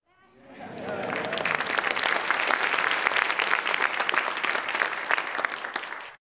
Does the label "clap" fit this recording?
Yes